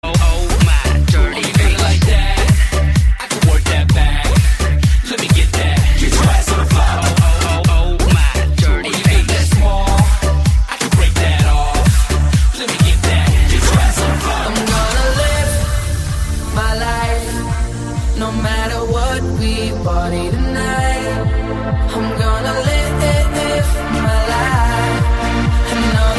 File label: dance music tone ringtone